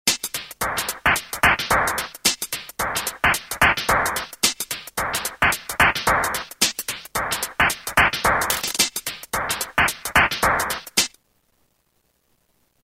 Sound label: Shufflin' 110; Roland M-DC1